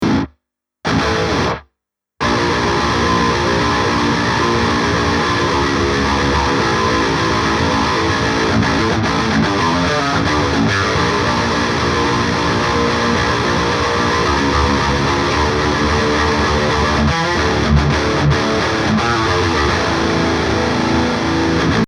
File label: rhythm guitars